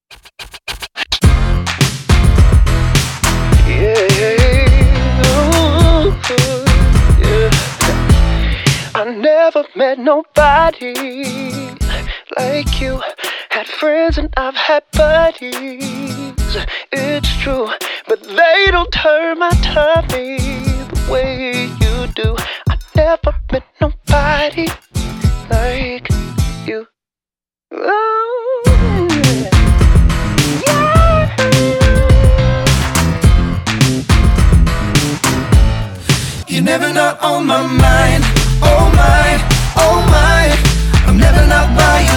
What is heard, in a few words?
Hip-Hop